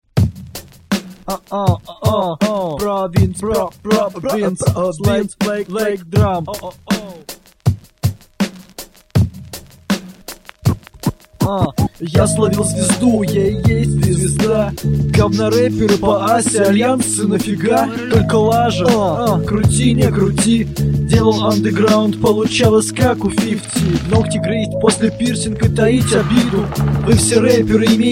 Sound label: drum